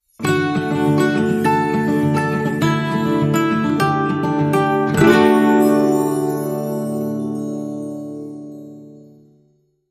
Guitar Sting Short

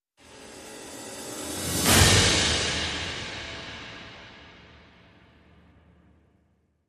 Cym Precinct

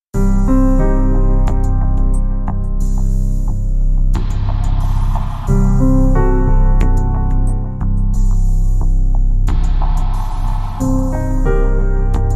No Flutes and Strings

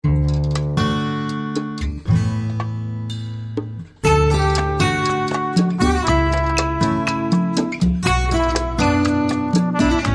Lullaby

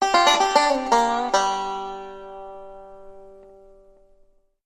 Banjo Lick

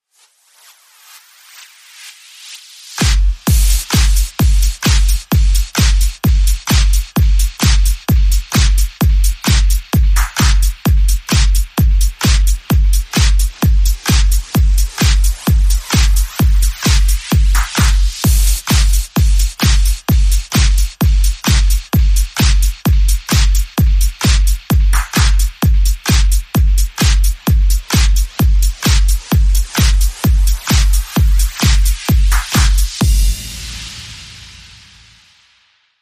Beat

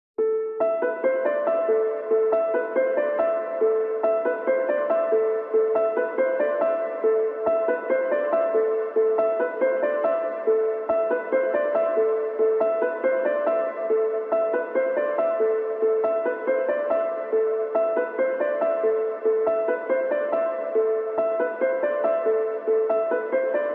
Subtle Pianos

